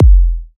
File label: dope kickdrum sample f key, Royality free kick drum sound tuned to the F note; kick drum samples